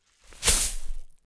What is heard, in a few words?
Dropping Beans Coffee Sack